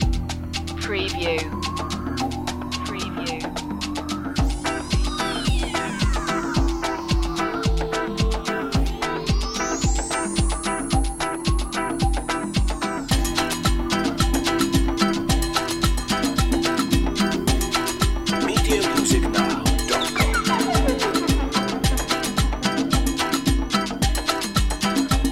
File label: peruvian patrol loop